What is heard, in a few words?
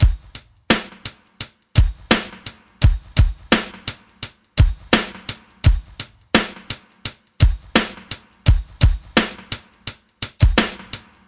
085 nothing to say piecemeal, DRUM LOOPS and BREAKS